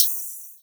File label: chip cymbal ride